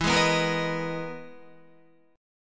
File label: E-Major Sharp 11th E, Guitar; EM#11 strummed; JGuitar